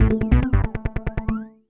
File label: SNTH BASS ELCTR 140 A, Techno